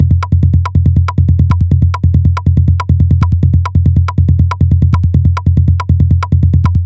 tribal loop

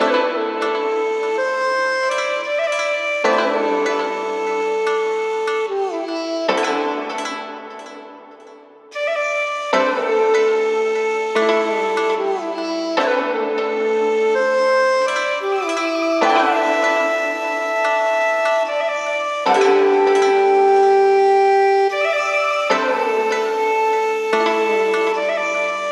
puzzle games music, mahjong title

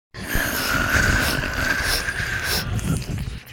zombie sniffing sfx; zombie pack sfx

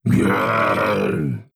Alligator Attack

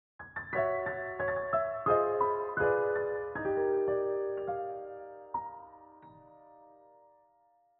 Game Over Jingles; Game Over Jingles Pack; Repeating Dream

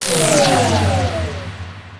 power down; Electricity Game Sound Pack

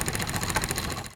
spinning

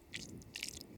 slime squelch

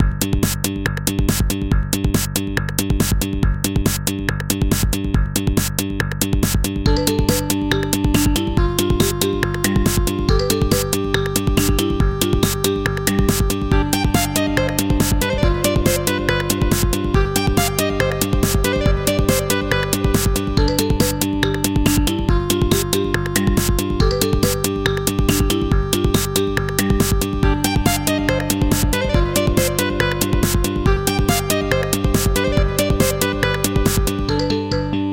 Jungle Groove Grove

